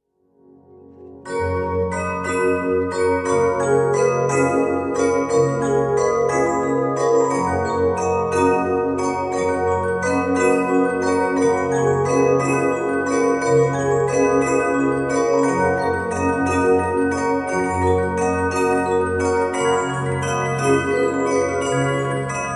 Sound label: Barcarolle